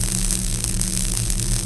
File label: Cosh Active Loop, Electric Cosh